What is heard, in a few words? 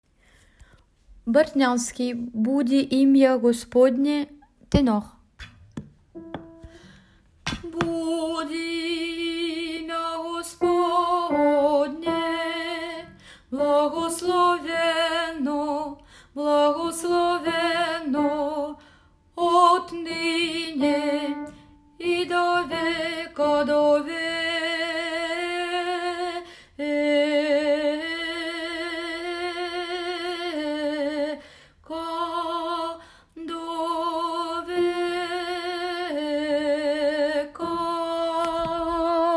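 boudi tenors; boudi imya gospodne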